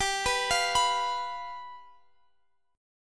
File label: G 7th G